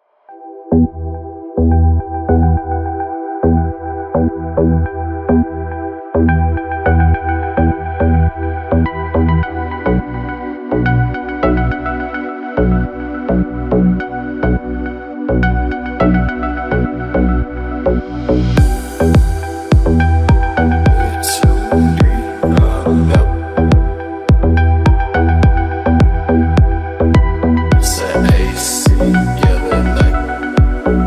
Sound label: deep house remix